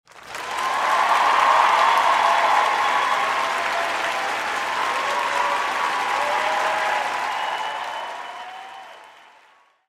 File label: cheer